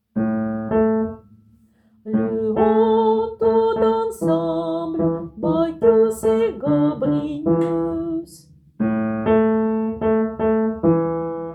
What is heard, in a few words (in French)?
Bacchus voix grave